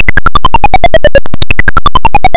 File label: falling; manicminer